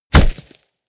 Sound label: body fall snow low punchy